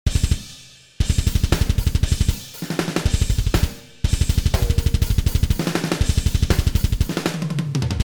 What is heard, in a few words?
Metal Groove